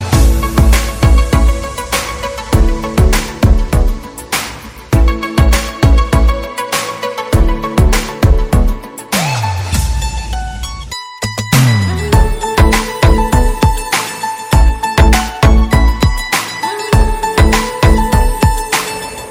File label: Instrumental Ringtone